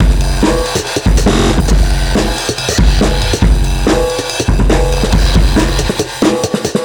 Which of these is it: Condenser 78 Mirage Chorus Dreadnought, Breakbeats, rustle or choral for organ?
Breakbeats